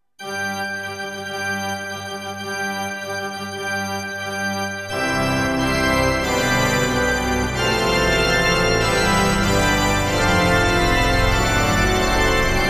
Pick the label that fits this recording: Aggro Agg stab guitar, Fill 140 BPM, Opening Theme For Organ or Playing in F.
Opening Theme For Organ